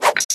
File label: candy negative switch sfx; Candy Crush Friends